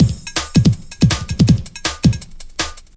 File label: Bright 162, breaks; minihalfs